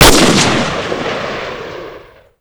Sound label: fn2000; Modern Warfare